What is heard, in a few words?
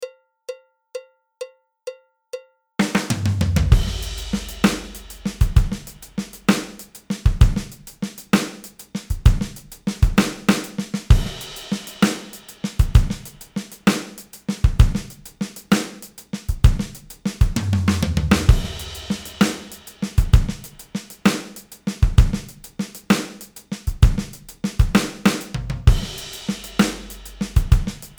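half time shuffle, drums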